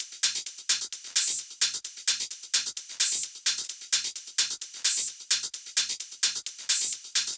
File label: 130BPM Organity Kit; Vengeance Samples